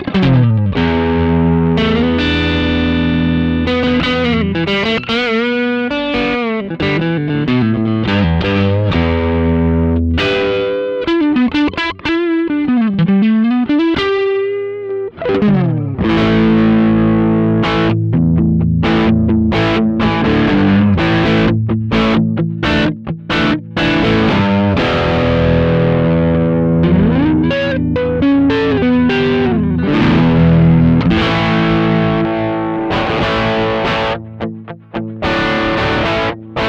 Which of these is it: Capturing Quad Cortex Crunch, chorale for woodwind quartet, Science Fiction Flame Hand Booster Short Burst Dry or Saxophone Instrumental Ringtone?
Capturing Quad Cortex Crunch